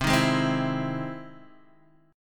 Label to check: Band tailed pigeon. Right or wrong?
Wrong